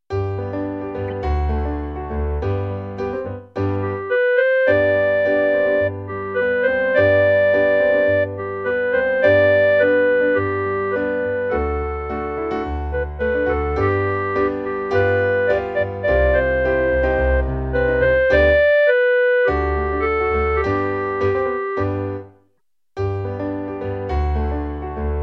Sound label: CLARINET; When the saints